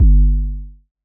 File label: electronic kick tone; soundscrate